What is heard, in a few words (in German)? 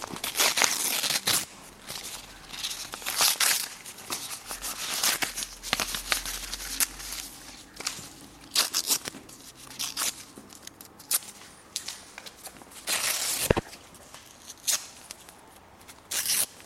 papierschnipsel